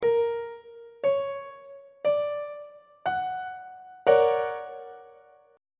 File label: e double flat augmented major 7th 2nd inversion on treble clef